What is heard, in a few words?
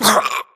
grunt death neck; Titanfall 2